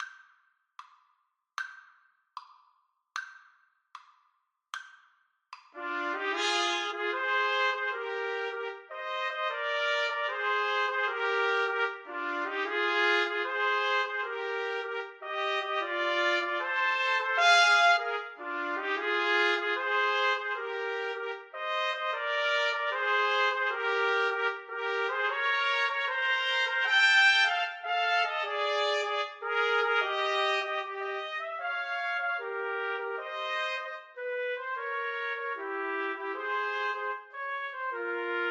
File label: Trumpet Trio